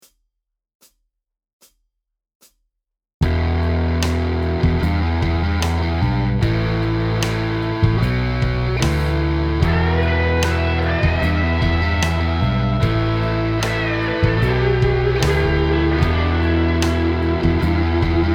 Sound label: Em Pentatonic Riff